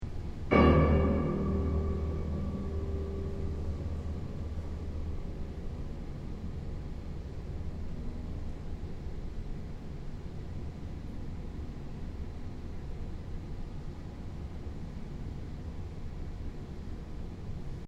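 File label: Piano Eb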